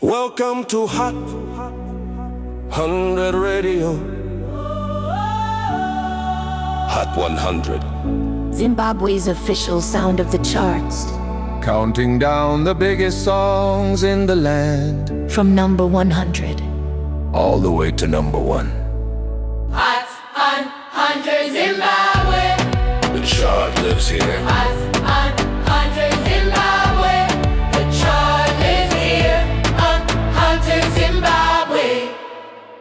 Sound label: jingle; radio